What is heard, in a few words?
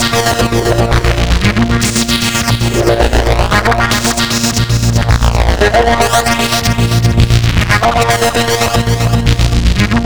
Hott Fuzz, Synths; Sonic Foundry (Sony Creative Software); Modular Electronica